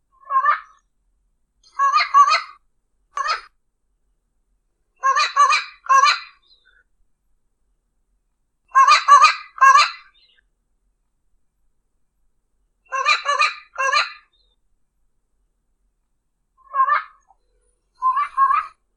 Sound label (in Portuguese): Canto do Papagaio Campeiro